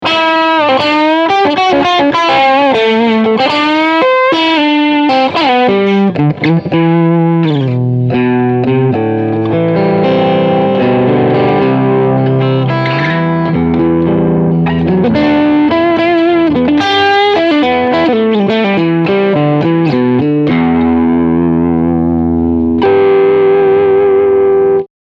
Channel 1 Crunch

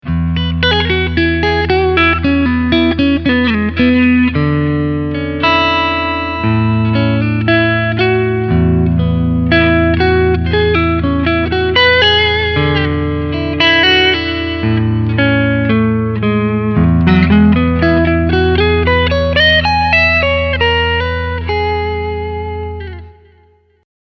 Clean Picking Front HB

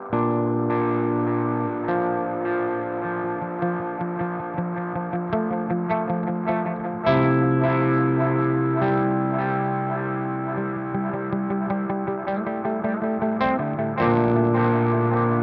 Timeline Digital; Strymon

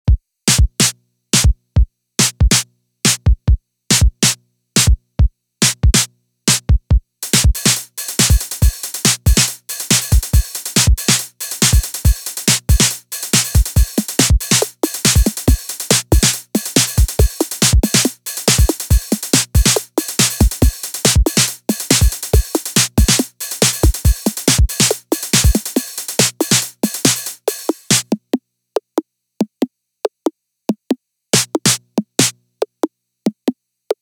Beat

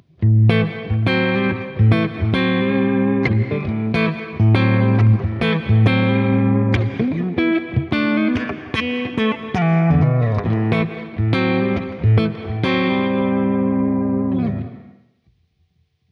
Reverb Decay 0; Prussian Blue

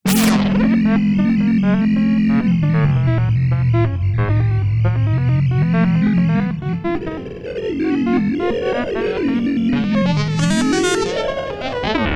ARP2600M Three Tracks of SFX

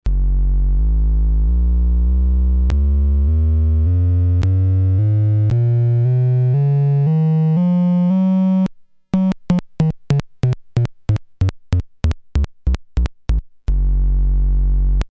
sine

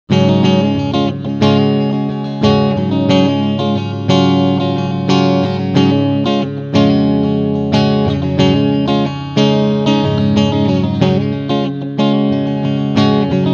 clean strumming beide picku

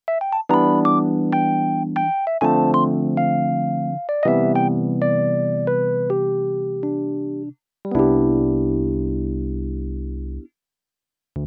Rhodes Solid State

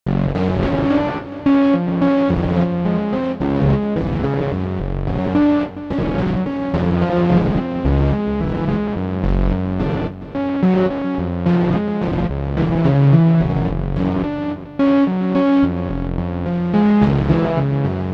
Elektron Digitone Broken

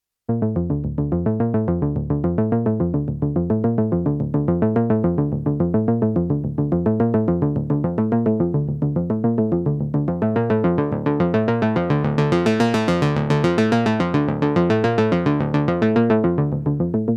Dimension D Juno 60 Arpeggio

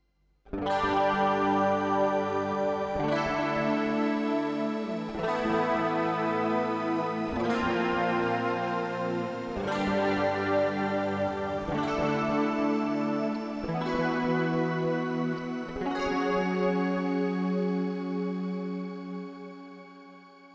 Time Warp

